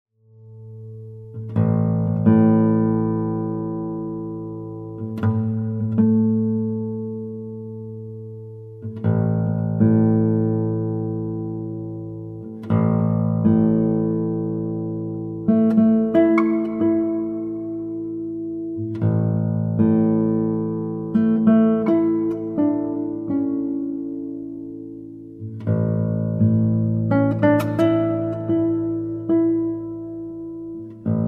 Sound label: Ancient Bells